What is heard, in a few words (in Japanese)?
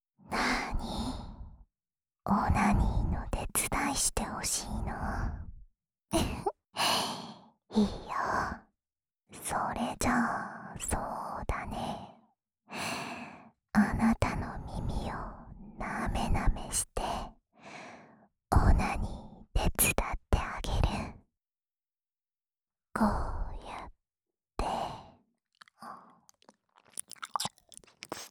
耳舐め／左, ASMR; オナサポ用; 富豪の嫁選び～重要なのはやっぱりHの相性だよね～; プレミアムフォーリー